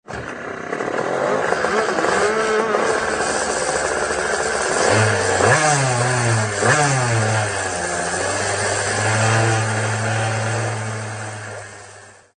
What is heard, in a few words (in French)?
karting